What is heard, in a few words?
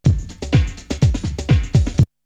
Drumloop; ZERO G - Datafile DISC 2